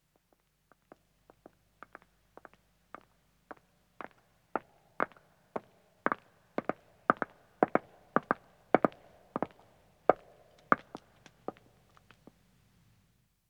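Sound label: Stone Walking, 2 Women Approach, Footsteps; BBC Sound Effects Library; Footsteps II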